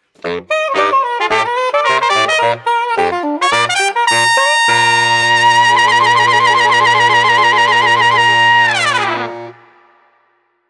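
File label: Street Brass New Orleans Transitions, 95 bpm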